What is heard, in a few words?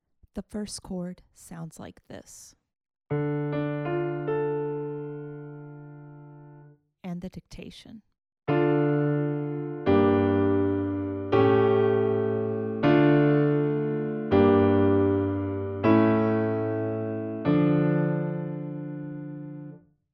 harmonic dictation